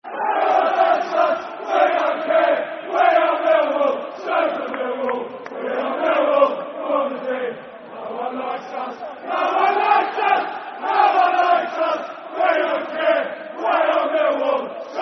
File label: Football Chant (Soccer Song); We Are Millwall; Millwall Mfc